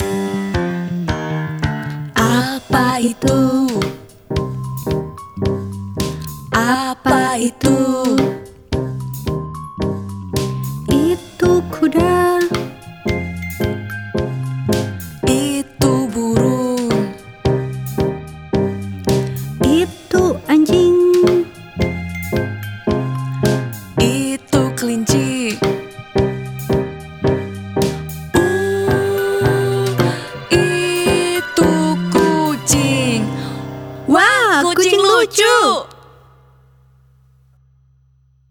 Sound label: song indonesian